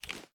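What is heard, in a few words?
pistol reload fast extended mag start; gmod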